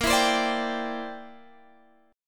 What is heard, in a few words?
C Major Add 9th, Bb, down, Guitar, Standard; strummed; Cadd9